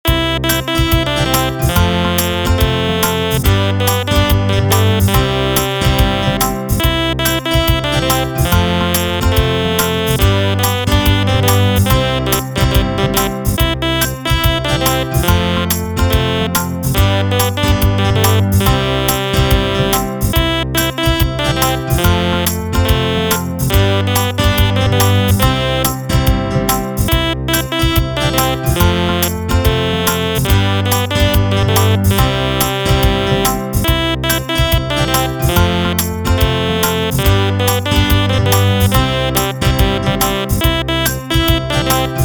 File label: stylophone; stylophone by superwave